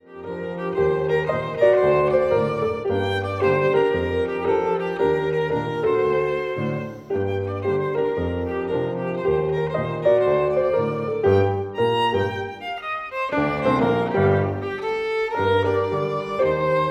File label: VIOLIN